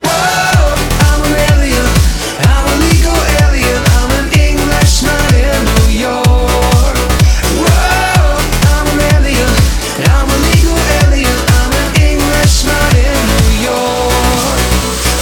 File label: Electro